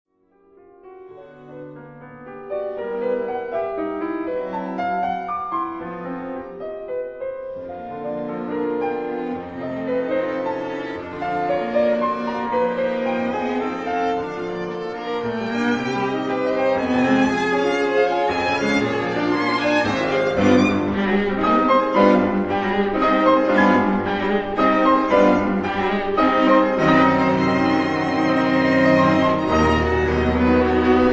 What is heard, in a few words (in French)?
Quatuor piano